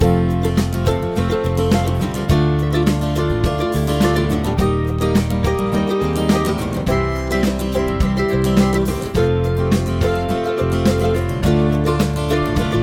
chord prog with mixture

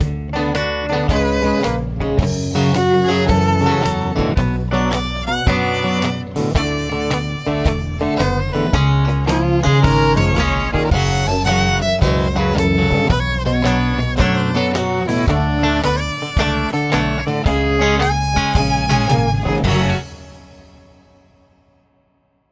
minor key chord triples, F sharp

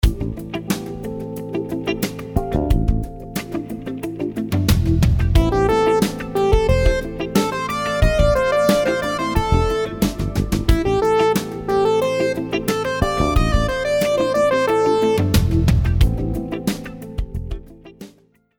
rhythms 16s syncopated

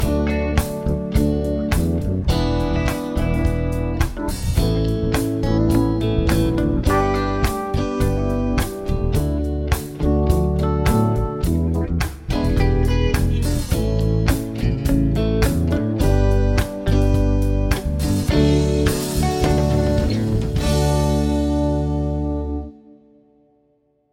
progs with applied chords; harmony

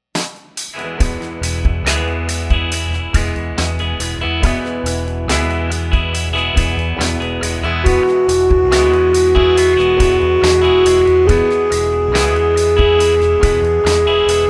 scale degree

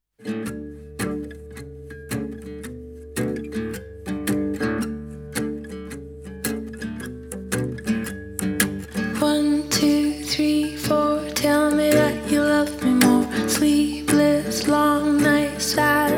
4-4 shuffle

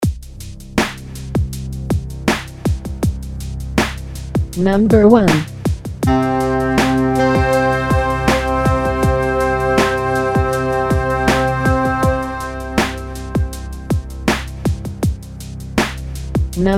intervals min 8 up

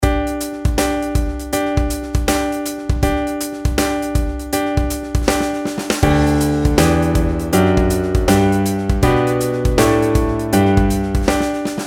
pitch patterns skipwise

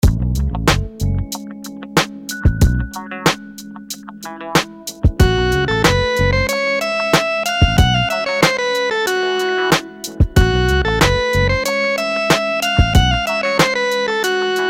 rhythms dotted 8s

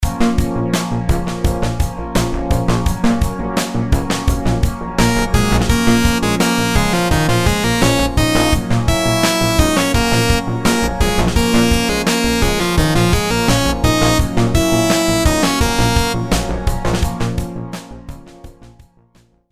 rhythms simple dotted 8s 85

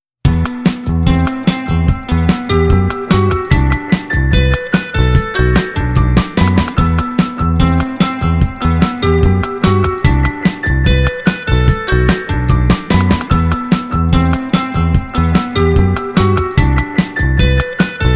Em, chord loops minor; harmony

